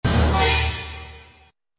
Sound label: shocked sound "o"